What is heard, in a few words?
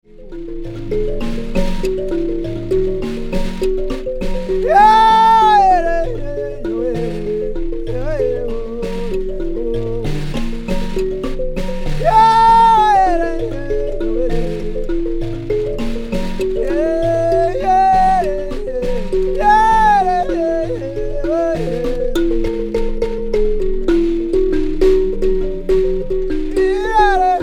mbira